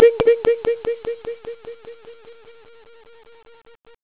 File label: bink